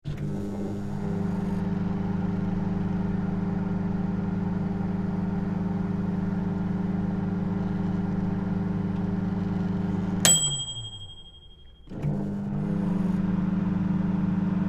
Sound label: Microwave Oven, Start and stop with finish ding; Appliances; Household; Appliance Sound Effects